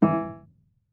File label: marimba piano; ゆめ2っき